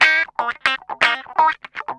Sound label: GUI, LICKS; 120 LICKS